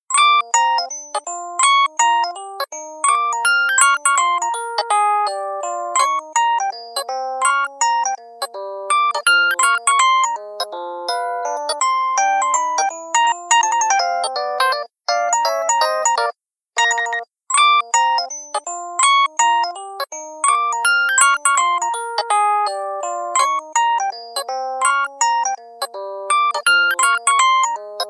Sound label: Jazzy, ringtones; LG Revolution VS910